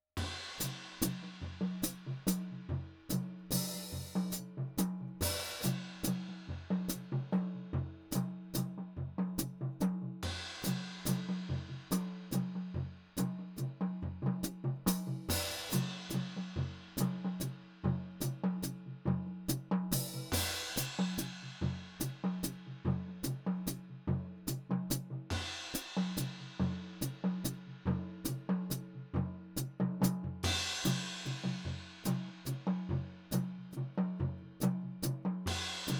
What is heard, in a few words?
hihat; drums; strangehome